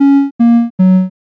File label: picosad